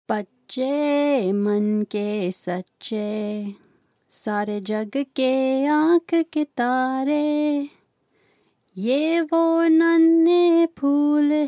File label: india song